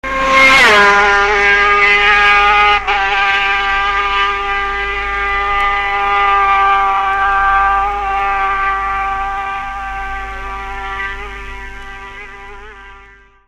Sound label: Yamaha, vintage bike; 1966; Reid